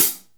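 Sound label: hi-hat closed, cymbals; cubase; DRUMS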